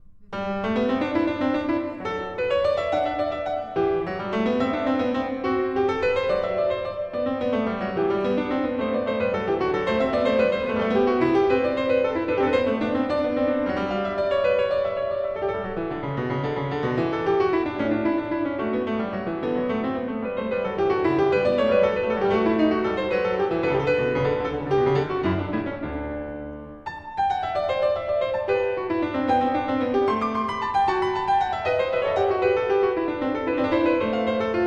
Bach Goldberg Variations BWV988 Variation 27 - Canone Alla Nona (Un Poco Vivace)